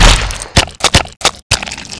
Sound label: explode; svencoop; scshogo